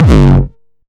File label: 909 Dist Reso - F#1, Kicks; Mbase 11 Kicks